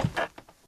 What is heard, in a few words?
bridge step; Golden Crayon Sounds